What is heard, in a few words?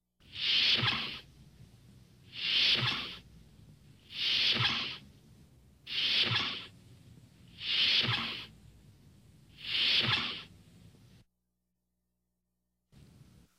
swoosh; TOS